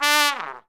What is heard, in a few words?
FAST FALLS; E-MU Formula 4000 Series Vol. 5; Protozoa; Proteus1 Instrmt